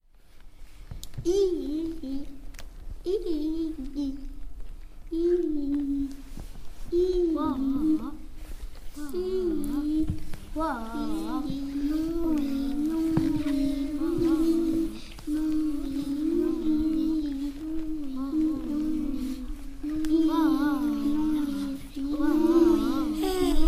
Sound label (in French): a la maniere d un chant pygmee